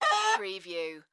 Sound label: Bird Rooster Bawk; sound-effects-bible